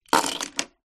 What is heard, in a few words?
leisure toy cars metal set down on table